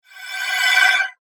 Vanishing Spell; Magic Sound Effects; fantasy sound effect; Andrew Fleming

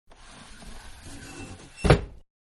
Window Close; Windows (Sound Effects:Household); Manhattan Production Music

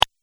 Positive Click, Click Sound Effects

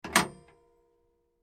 misc telephone receiver lift kiosk internal; Electronics; McKinney Sound

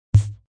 Bass Snare

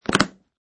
Suitcase Lock; Suitcase being locked

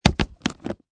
Sports Bowling Bag Set Down

